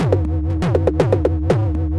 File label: storm loop; maudio; Elektron